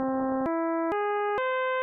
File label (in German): c e gis c